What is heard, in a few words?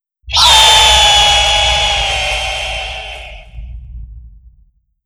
wing call; voidwing